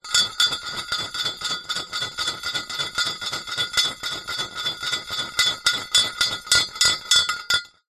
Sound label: foley wood thin pole circular movement spin inside metal tube close up